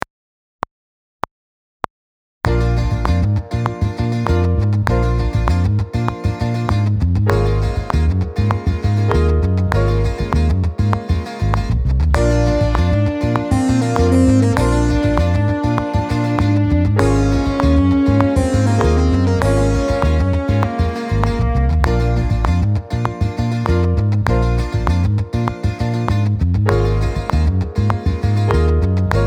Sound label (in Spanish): Cancion ritmo de corcheas